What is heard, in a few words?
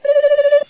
Bblblblbl